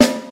dope steel snare drum sound b key, snare single hit tuned to the B